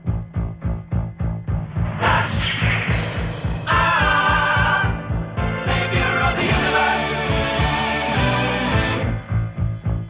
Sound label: ringer; Ringtones